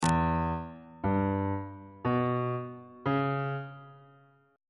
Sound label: f flat minor 6th chord intervals